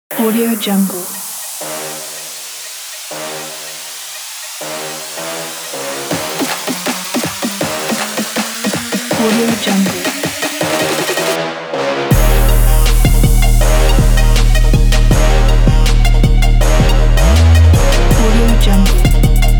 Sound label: this is trap